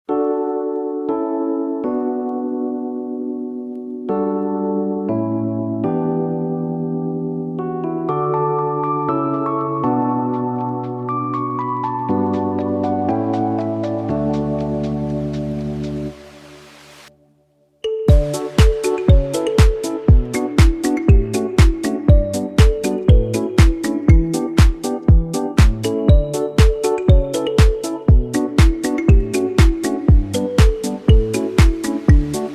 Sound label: Saltwater Piano; Lunch Chill Loop; vlog; 春